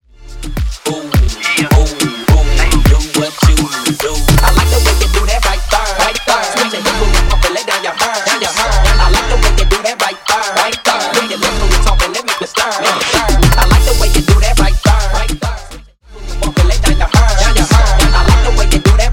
Reggaeton Beatshow Clean 105bpm

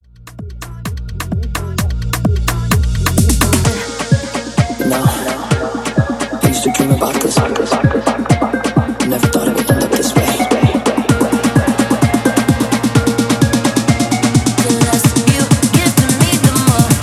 Tribal Drum Dance Extended 129 BPM

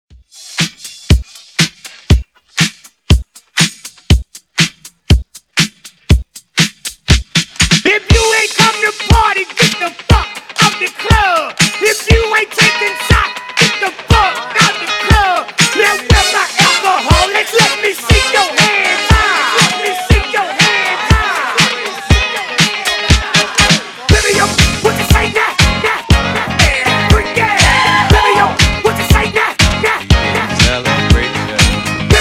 Hype Intro Redrum 120Bpm

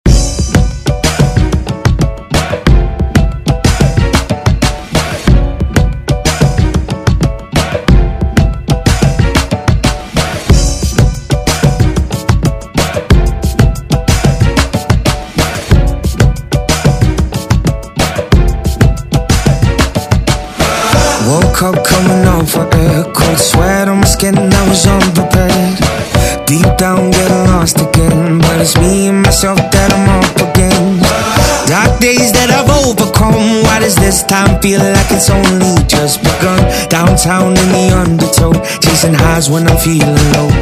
Moombah Redrum Intro

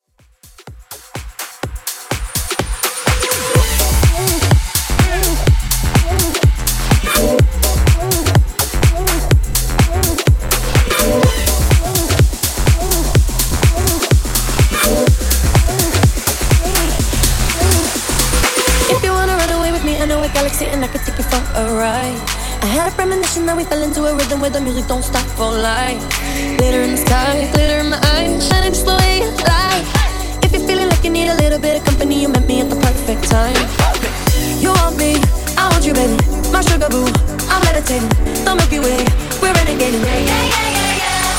Club Extended 125Bpm

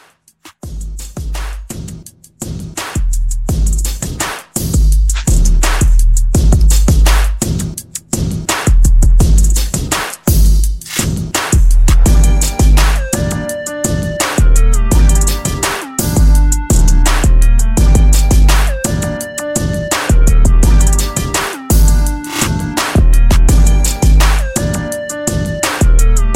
Tipsy Re Drum 84

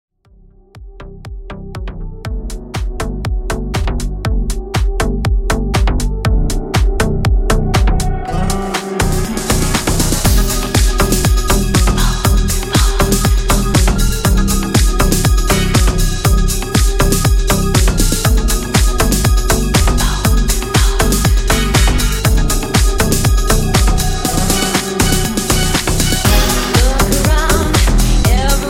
90s House, 120 Bpm

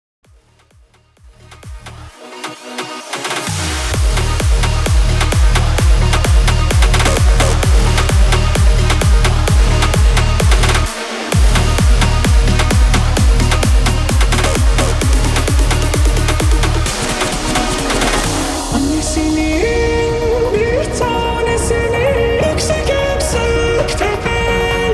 130 BPM

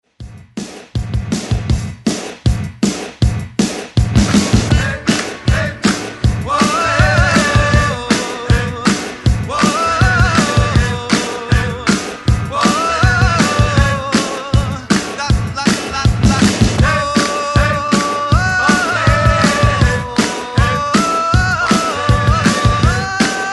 60s ReDrum 159 Bpm; DJDOLLS

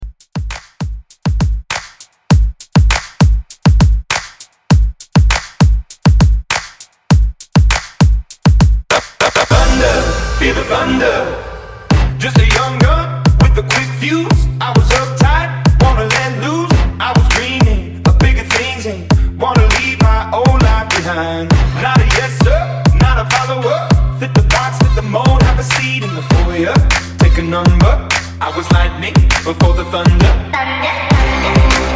Redrum 100Bpm

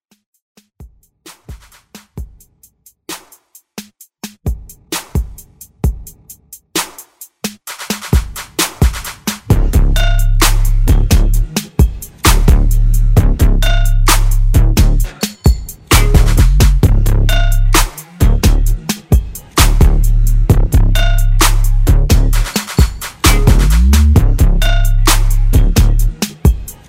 Pop

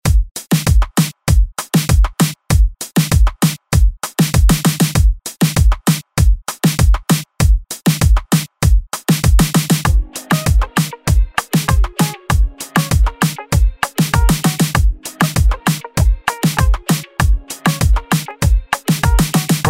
Afrobeats Remix 98bpm

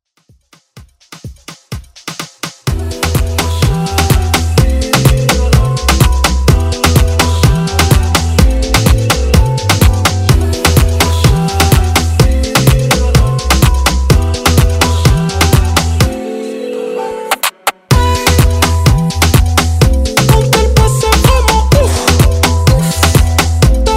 Birthday Afro Redrum

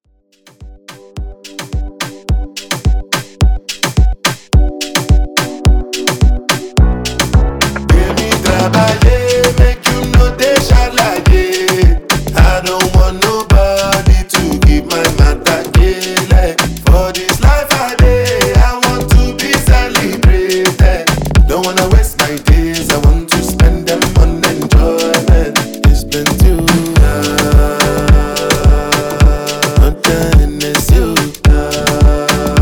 Moombah Redrum

